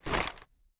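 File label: gear rattle weapon large; viper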